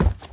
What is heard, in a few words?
Step Hard Surface